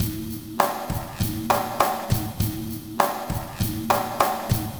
Latin 100, Lounge Drumz

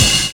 CRASHING; Univers Sons

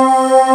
SYN AIR C3, Analog, Synth; E-MU Formula 4000 Series Vol. 3; Analog Odyssey; Synth Builder

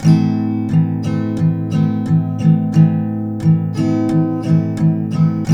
TK MIN A#2, Guitars; Spectrasonics; Hans Zimmer Guitars VOL-1

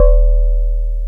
DX PIANO

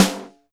Snare, Alesis DM5

